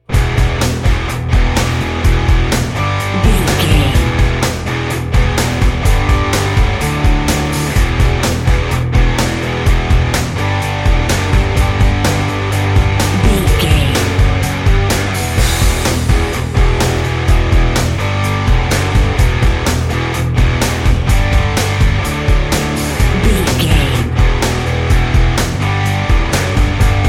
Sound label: Sports Rock, Pop Rock, Sports Music, Rock, Hard Rock, Metal, Driving